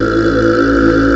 AAHH, HUMANS; M8; CMI Factory

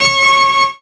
Harp Note; Zero-G Jungle Warfare 1, 2 & 3; Strings & Things

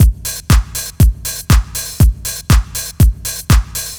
4 floor beat, trance

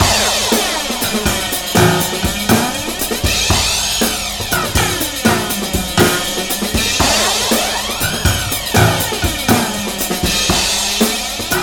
120 bpm; 90 s Sample CDs; Planet of the Breaks

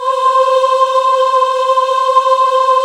AAH, VOCAL

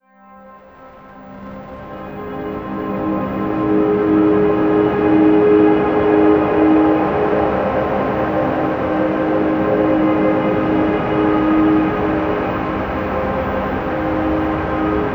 Fog Bound C Ab, Atmospheres; Zero-G Ambiosis

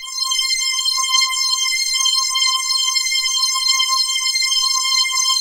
HIGH STR, House Dance

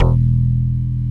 PULL BASS; Synclavier Sampler Library; KEYS AND GUITARS

